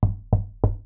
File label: Hollow Tripple Knock